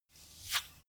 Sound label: bow and arrow flyby; krieg-waffen; pfeil-bogen; soundpool